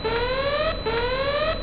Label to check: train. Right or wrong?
Right